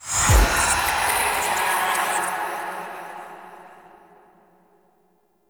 Hand Compass Flying Start; Warframe; Duviri

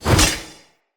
Mockery Weapon Common Heavy Whoosh L to R, Swords; Weapons; Player

